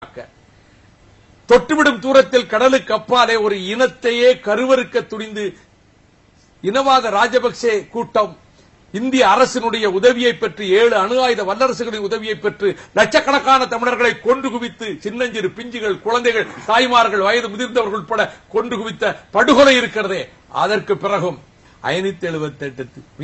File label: srilankan ringtone